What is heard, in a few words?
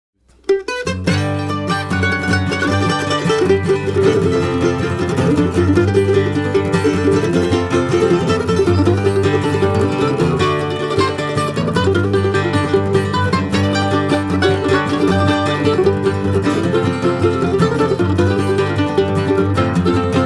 Bluegrass Breakdown